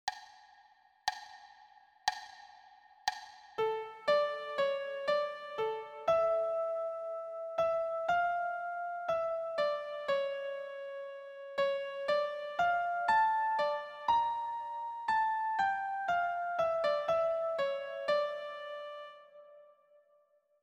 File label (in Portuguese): Re m piano completo